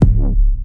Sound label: modern boom trap bass drum single hit c sharp key; kick